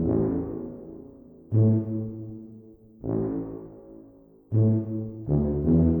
Tuba staccato, movie score; духовые

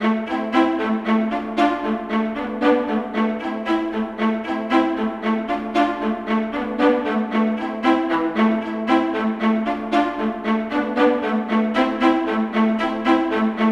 viola; виола

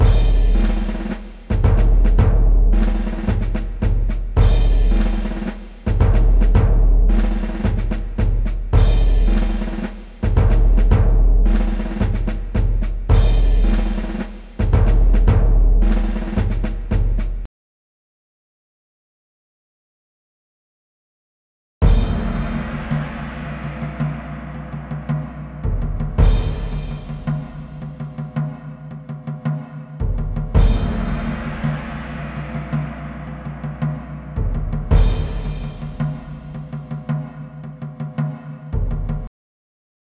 Orchestral Drums 110 BPM; Ударные (Drum Loops)

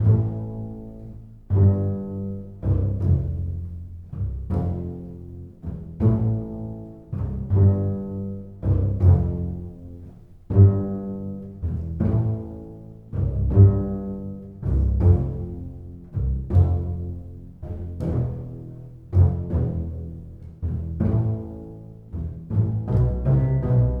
bass pizz; бас пиццикато

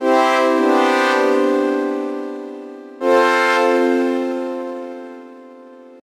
String Bra; Downbeat

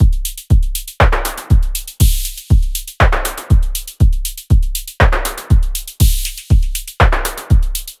Election Kit

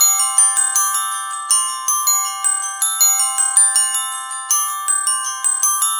Glockenspiel; сэмплы mallet эмбиент колокольчики

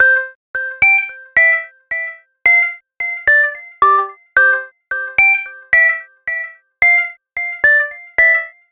Delayed Organ; Сэмпл клавишных (Ритм-н-блюз); Rnb, Soul; 110 BPM; Keys; Клавишные

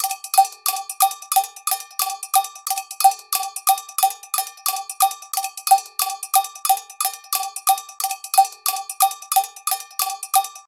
Cowbell 90 BPM Double

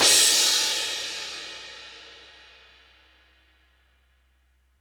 Crash C

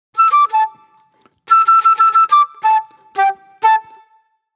Flute Solo D; Сэмплы духовых инструментов (Легкая музыка)

